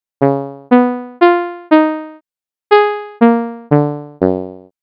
Envelope Percussive

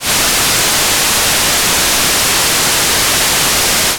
Noise Classic

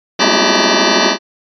cluster open quarter

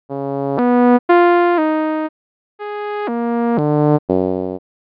Envelope Combined

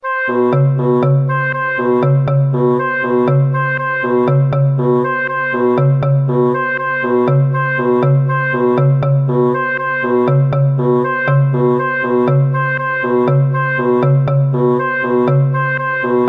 Feedback Uniform

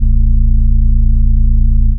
Stack Of Sevenths

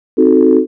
cluster sine

